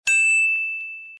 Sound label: ding Sound Effect